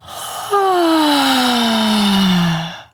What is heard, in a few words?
Med Sigh, Mother, Vocalizations; Warframe; Deimos